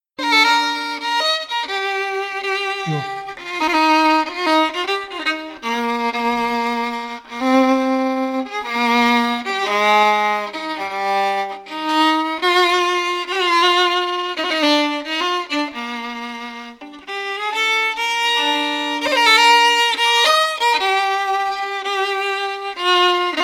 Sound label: fiddle; dean bridge of edinburgh